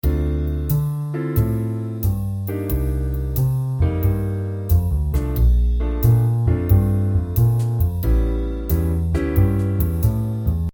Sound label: jazz